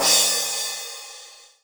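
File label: cymbal, drums; Best Service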